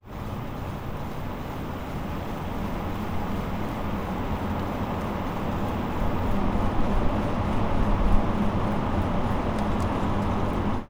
pass By forwards tires, EV2 Tires Only, electric vehicle; model Y